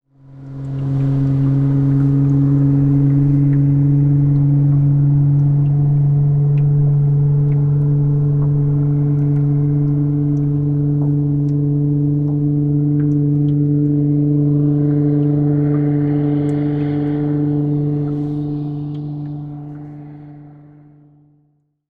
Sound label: Motor Boat in Distance